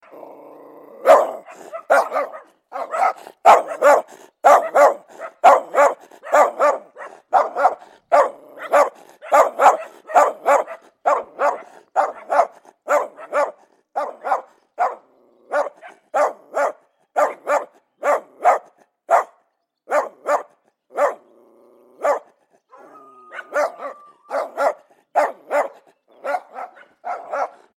wild dog sound; angry dog; animal

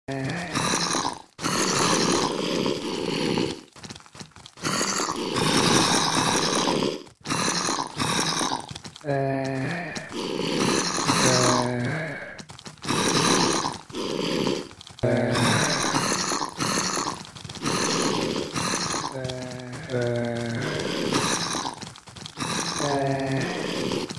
baby zombie mincraft; games

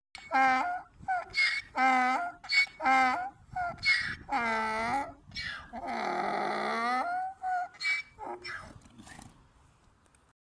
Donkey sound; صدای الاغ و خر

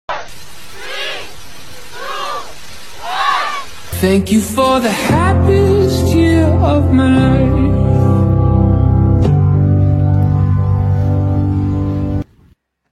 happy birthday to me

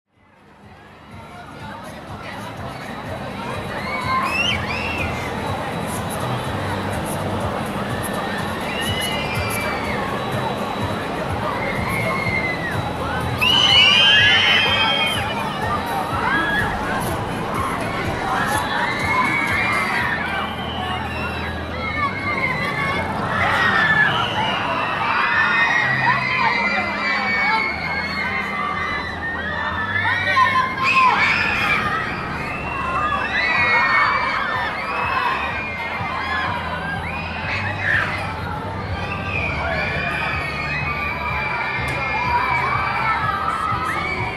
Amusement Park

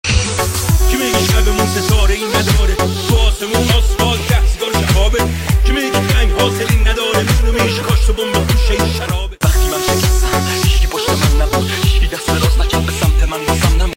Remix

